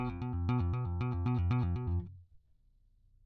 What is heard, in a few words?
bass